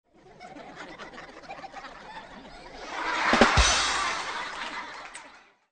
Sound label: Megaphone